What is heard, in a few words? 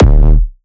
Kick Dist Short; Darealwollen